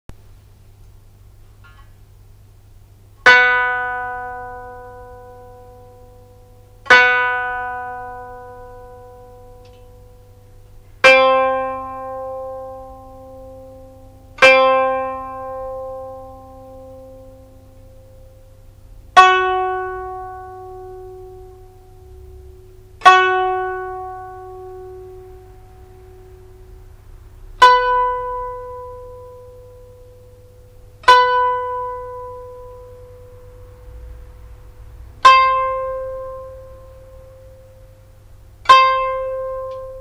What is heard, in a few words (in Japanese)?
箏 調弦; B C F# B C F#; 一を壱越にして平調子より四、九、六、斗を半音上げる